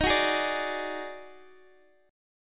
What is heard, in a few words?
Eb Minor Major 7th Double Flat 5th Eb down Guitar Open D